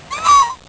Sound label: goose